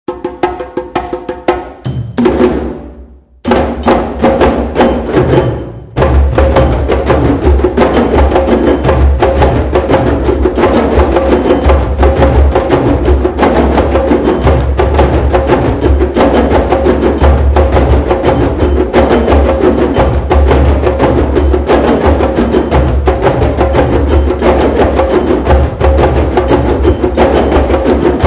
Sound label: rumba congo